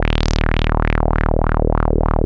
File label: WAH WAH D#1; CMP - Analogon